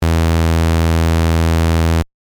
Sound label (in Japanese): 生 相 SAW